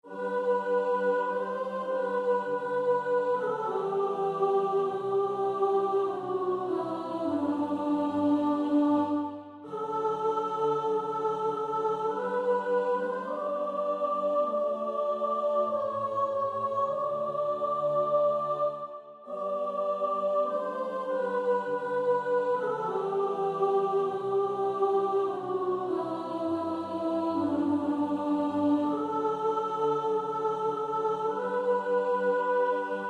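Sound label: soprano, practice music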